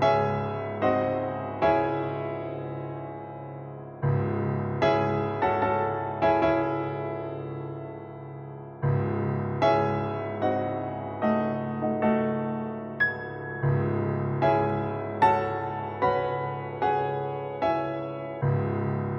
piano, Loops Sad

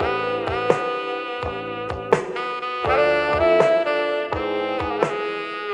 sax sultress, Loops; Phonky

